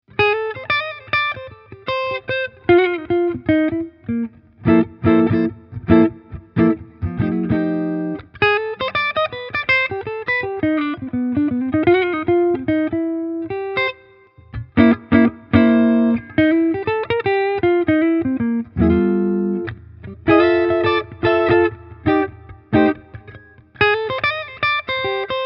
Harley Benton SC Custom III Halstonabnehmer clean bluesy

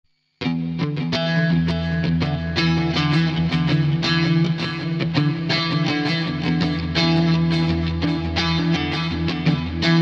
DI Aufnahme EQ aus Plug in

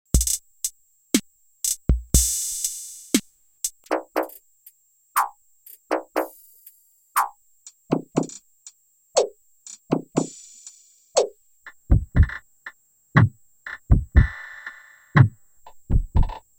Drums mit Effekten Octaver

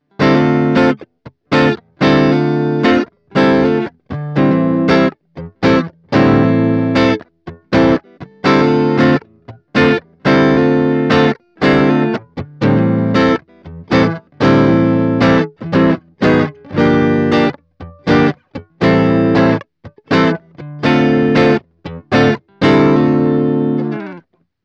Vox MV50 Direct Clean Tone 10 Uhr

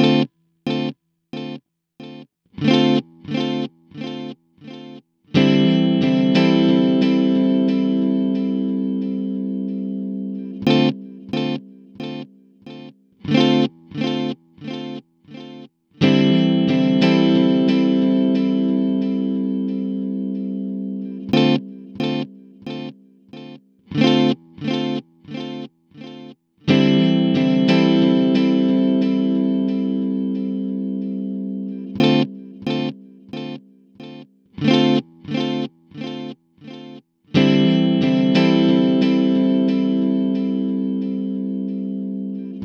Coole Rhythmen mit Delay Digital Delay 90 BPM ¼

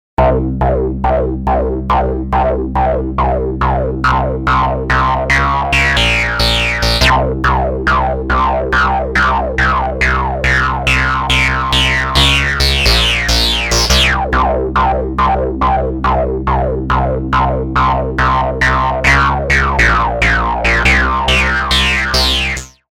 Bass Sequenz; Mini Brute V

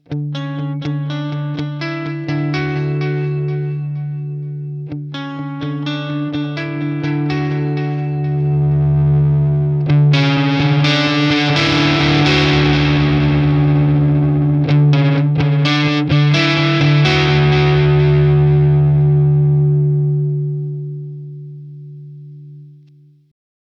Boss Katana Artist pushed Gitarren Volumepoti

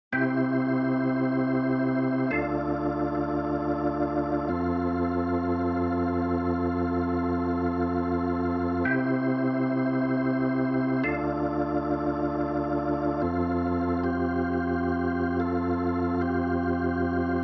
b3 einfaches Pad; Logic Pro 11; Session Keyboarder

